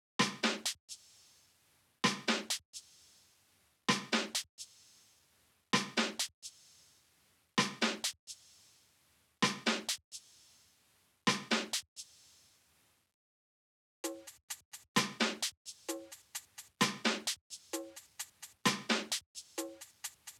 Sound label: Drums